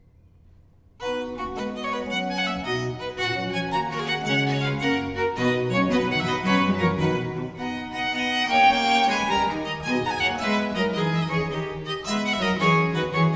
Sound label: Trio Sonata in C Major Allegro; Haendel; Musica sull'acqua; Corelli; Op. 1 No. 7